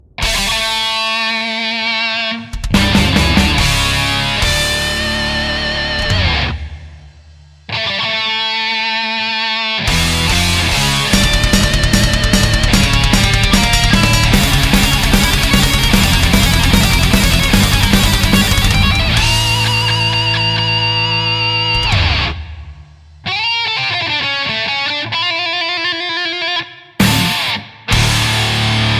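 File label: neoclassical metal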